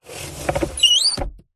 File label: household cupboard door roller squeaky open or close; zapsplat